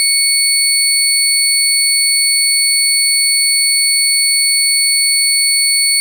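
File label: FM Sawtooth DX100 C sharp 2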